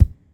round kick drum one shot F key, Royality free kick drum sample tuned to the F note; kick drum samples